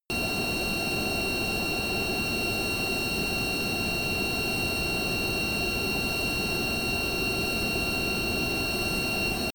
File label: military jet c-17 globemaster turbine full power direct; flugzeuge jets; verkehr transport